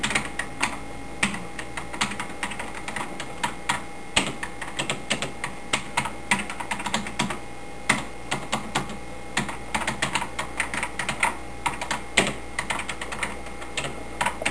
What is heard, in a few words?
TYPING, COMPUTER